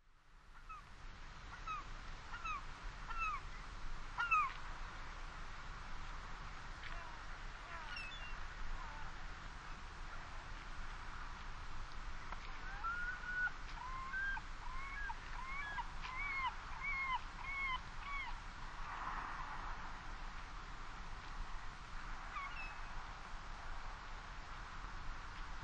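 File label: Slaty-backed Gull; tomamae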